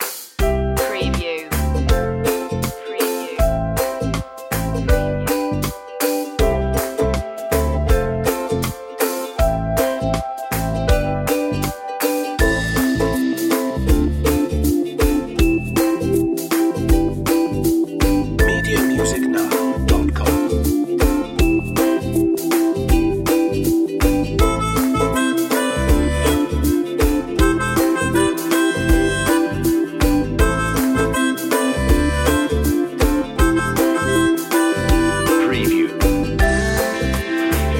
ukulele

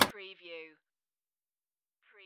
Pinball sound, solid clank

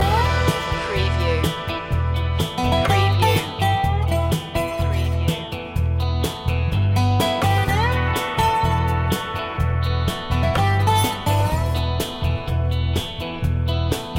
dark country loop

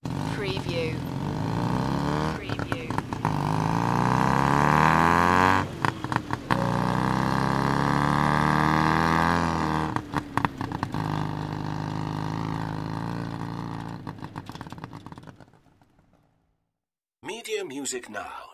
tuctuc acceleration

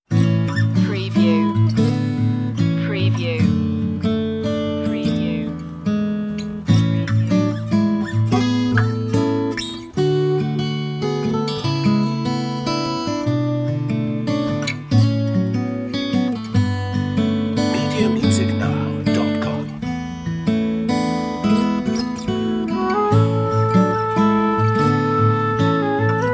deck the halls guitar and flute no sleigh bells

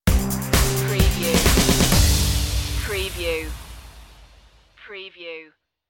new life stinger

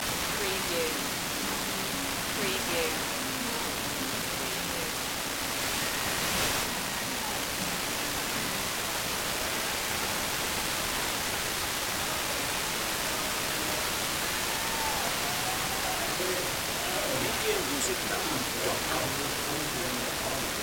Quasar TV Staticy Bad Reception Snow

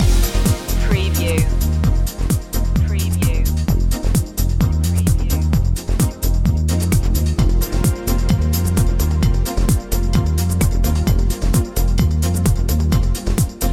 future run loop

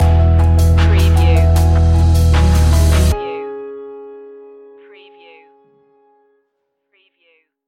timeless stinger

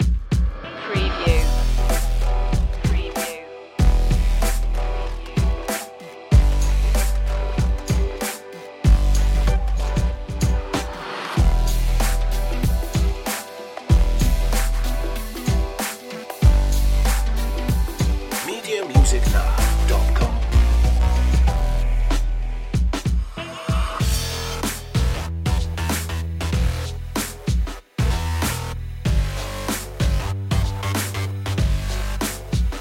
no lead

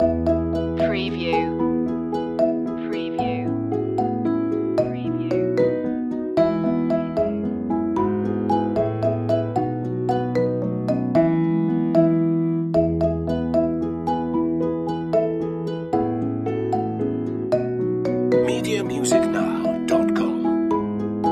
here we go round the mulberry bush instr loop

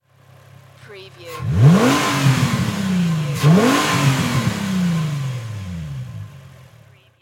INFINITY G35 ENGINE DOUBLE REV

